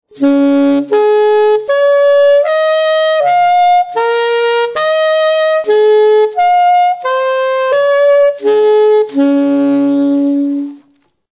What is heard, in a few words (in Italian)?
sovracuti, sax